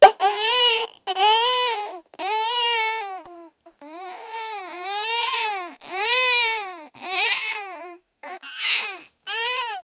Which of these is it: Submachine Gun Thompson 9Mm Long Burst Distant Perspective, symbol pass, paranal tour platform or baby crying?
baby crying